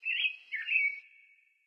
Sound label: Amb Birdvillage Birds